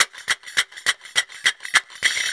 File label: Mizmah, Percussion; Sonic Foundry (Sony Creative Software); World Pop